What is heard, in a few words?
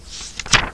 page turn